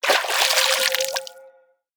Removable Water Tone; BTD6

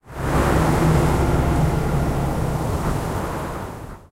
Boss Diamondback Switch Sun

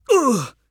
Damage; ZeeJay

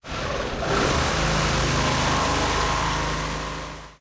Dreadbloon Rush

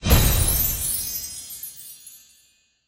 Legends Victory Hit

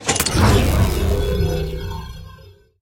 Removable Portal Switch; BTD6

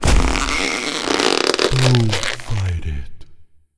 activated big squeeze

